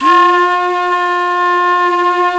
Flute, Singin' Flute

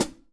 HH Ft mf, E-MU PX-7

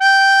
RECORDER G4; AKAI S-Series CD-ROM Sound Library VOL-7

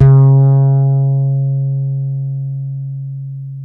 HOLLOW FRTLS D 4, Bass; Spectrasonics; Bass Legends

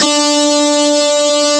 G3 GUITAR; AKAI S6000 CD-ROM - Volume 1; JOHNS GT

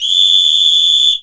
WISTLE

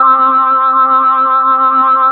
Dance Mega Synth; Best Service; 90 s Sample CDs

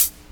HH, HIHAT HIP, Dance Mega Drums

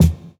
Kick, jungle breaks drum sounds

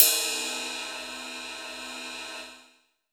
ride, cymbal; Ueberschall; Techno Trance Essentials; DRUMS

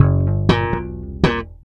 bass loop; AMG; Now CD-ROM (Roland)

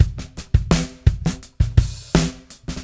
084, Beat Loops; Zero-G; Monster Beats

